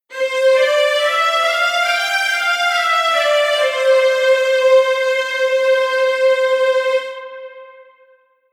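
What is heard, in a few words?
Strings Legato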